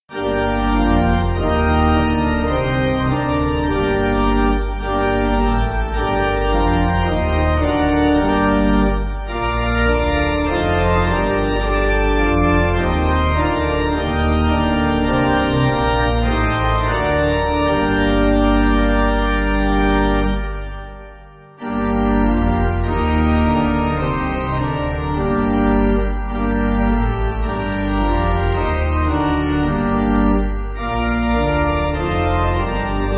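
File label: Chinese, Pipe, Pipe Organ, Backing Files for Churches; My Heart Looks In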